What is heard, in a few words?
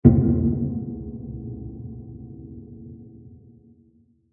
Diffuse Long Impact; Low Impact; Impacts; Crafted Signals